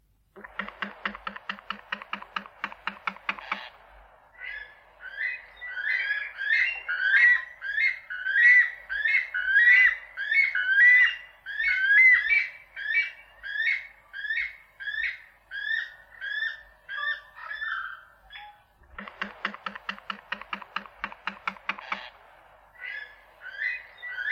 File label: Weka